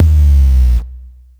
Bd Growler, Kick Drums; Zero-G; Soundclash

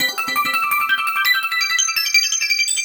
Rise Chime, Synchro Funk; Sequences; Sonic Foundry (Sony Creative Software)

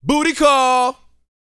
VOCAL F 220; Spectrasonics Vocal Planet

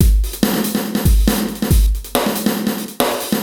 E Kit, Drums & Percussion, Electronica; Sonic Foundry (Sony Creative Software) - Electronic Point Blank

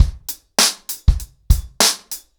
100BPM, ACOUSTIC DRUMS

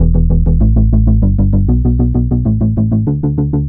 Bass, uk garage, 130bpm; Zona

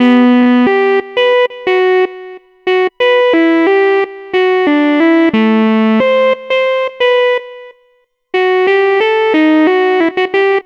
Ice Planet, Synth Sequences

